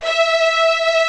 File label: grand piano & strings; OMI - Universe of Sounds; EII Factory Library